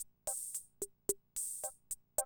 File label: CR78, DrumLoops